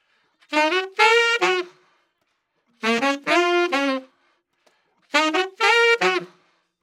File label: Sax; Mboxpro; AkG Perception; T-Bone sc450